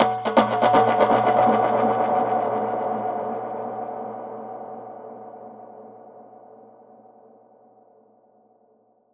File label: Perc Hit, dub percussion; musicradar